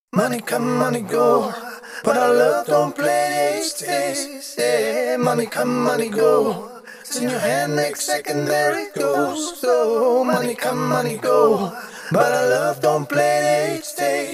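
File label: hip hop, 95, C sharp minor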